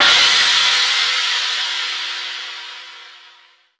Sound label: crash single shot c sharp key; Bitdepth 24